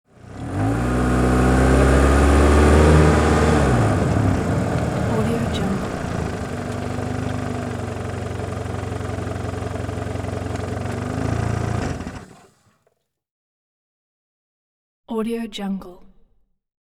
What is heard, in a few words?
sedan parking maneuver